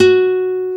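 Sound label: GUI NOTE, acoustic guitar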